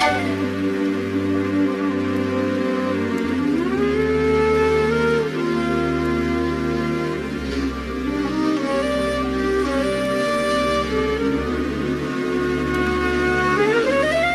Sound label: flute interlude, Loops